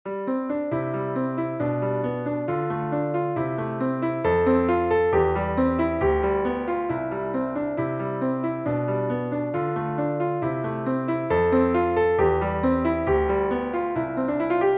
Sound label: Diamond Creek, Piano Solo